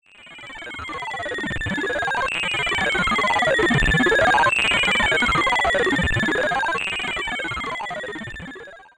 Sci-Fi Electronic Alien Computer; Science Fiction

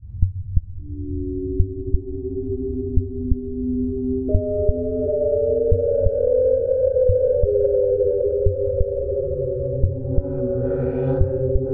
Drone Spooky Bed; Production Element Imaging; AdobeCC

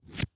Pin Pulled From Grenade, weapons; AdobeCC

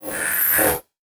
Buttons Mechanical

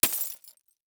Impact Mallet Hit Glass Debris; Impacts

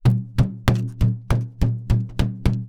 Cartoon Balloon Hit Hand Hits

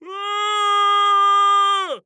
Horror Scary Human Male Scream

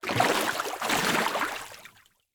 Liquid Water Water Wave Splash Two Splashes

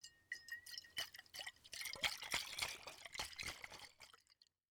Liquid Water Wine Glass Pour Liquid In

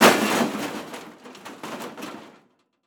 Crash Metal Tumbling In Pile Of Debris; crashes; AdobeCC

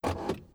Auto Nissan Altima Door Lock; Transportation

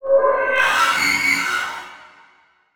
Production Element Title Transition Demonic

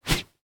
Tennis Racquet Swinging Heavy Swing; Sports

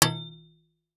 Impact Street Sign Metal Hit By Large Metal Pole

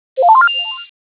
decode

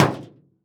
Impact Sheet Metal Impact Machete Hit Car Trunk

